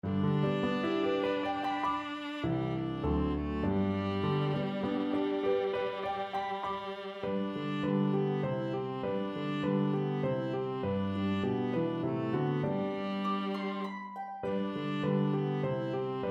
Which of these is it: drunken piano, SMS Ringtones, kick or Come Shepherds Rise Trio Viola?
Come Shepherds Rise Trio Viola